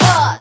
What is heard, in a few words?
Vocal Oneshots; Vengeance Samples; Vocals